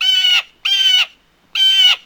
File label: blue jay; WAR